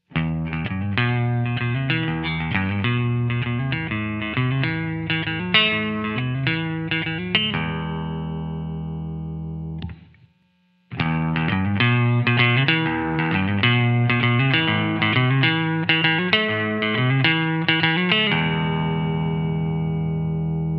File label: transparent; Damage Control